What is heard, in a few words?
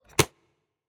arrow impact light